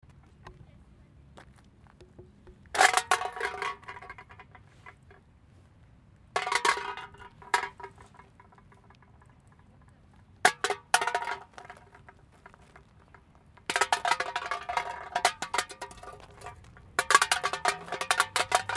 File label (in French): canette ki roule